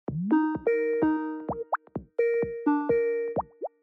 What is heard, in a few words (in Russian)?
zvuk zvonka v skajpe skype